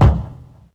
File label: small reverb bass drum c key; tuned drums